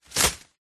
tree branch thick healthy leaves impact slap against surface; foley; zapsplat